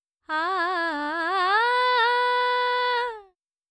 female vocals dry 4-4 Bm 100; Sample Banks

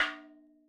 madalam notes L; MADALAM 110 7 8; Sample Banks; Percussion; MADHALAM

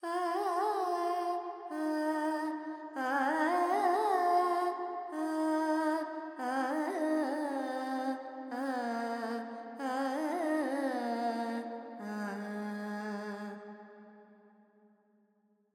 Pentatonic, Vocals Carnatic Female Alaps 70 G

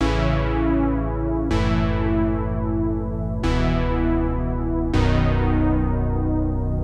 Juno Vintage Chords C#m 140; Melody; Keyboards

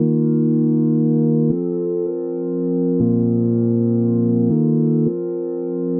Keys Mellow Chords Gm 160; Sample Banks; Melody; Keyboards

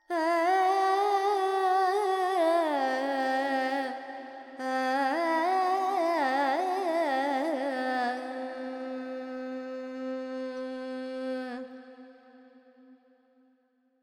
Carnatic Female Alaps, Panthuvarali, 100 G, FX; Sample Banks

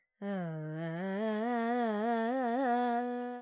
Phrygian, Vocals, Carnatic Female, Alaps, Dry; Sample Banks

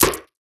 Perc; Sample Banks; Percussion

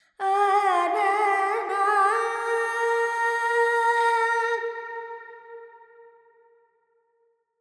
Carnatic Female Alaps, Lydian, 70 G, Verb; Vocals; Sample Banks

